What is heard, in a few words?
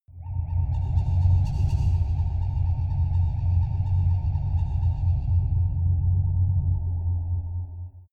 dark shuttle